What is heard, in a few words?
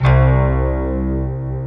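slow strings guitar; Optical Media International - Sonic Images Library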